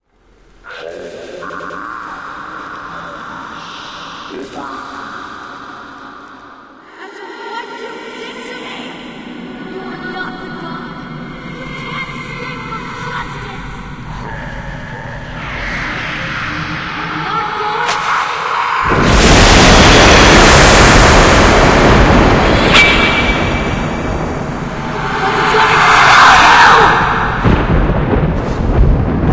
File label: background; st mission; hs c4